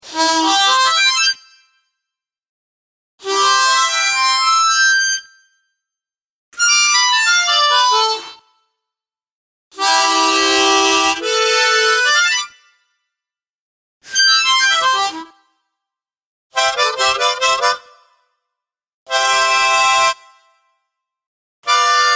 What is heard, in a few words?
Harmonica, Various harmonica sounds; Musical